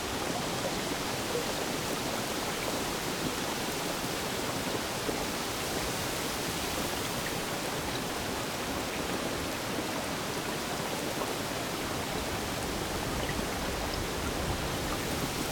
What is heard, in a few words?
rain